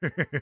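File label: laugh short, German; Kurzes lachen 3; Sniper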